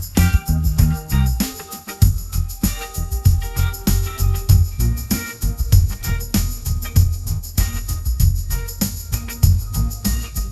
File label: wake up funk groove, ringtones; ai-generated